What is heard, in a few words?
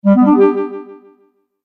Message Alert, beep